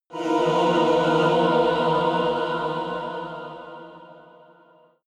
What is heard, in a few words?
evil choir chord medium